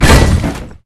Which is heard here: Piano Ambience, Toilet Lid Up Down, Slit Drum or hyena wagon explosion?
hyena wagon explosion